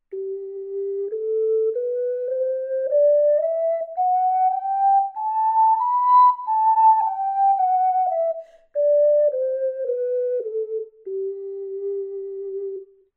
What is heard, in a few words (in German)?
G Tonleiter, ocarina